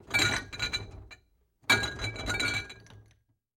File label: Glass Clinks Glass Bottles